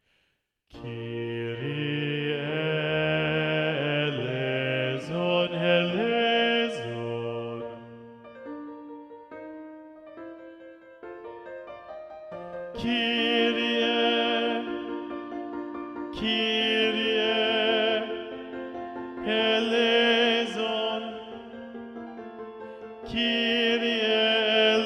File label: Kyrie - Bass Predominant; Haydn; Little Organ Mass; Choral Society of Greensboro